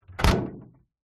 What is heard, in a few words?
household drinks fridge close